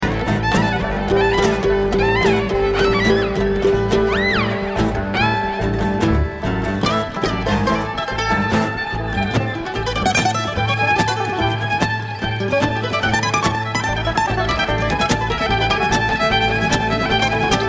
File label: Traffic Jam